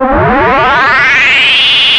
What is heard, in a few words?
ODY, ARP Odyssey